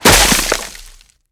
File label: Axe Chops Wood Heavy